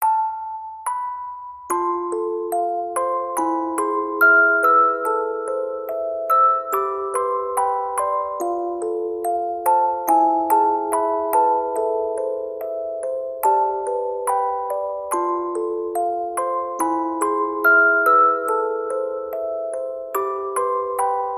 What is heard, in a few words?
music box